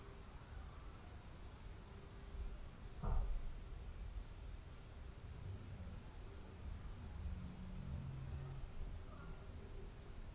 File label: Static Empty House Located on Main Staircase